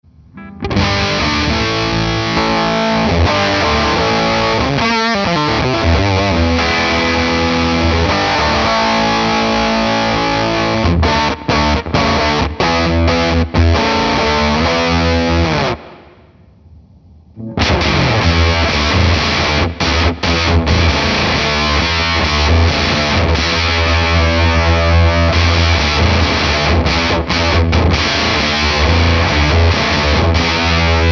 Stone Deaf Rise n Shine Orange Mode Blue Mode Voice